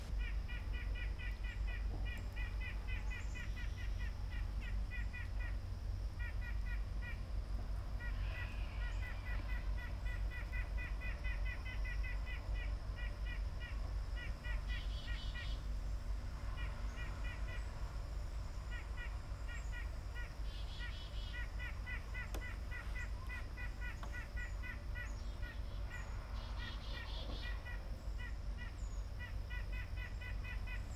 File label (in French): Sittelle a poitrine blanche; Suzanne Filteau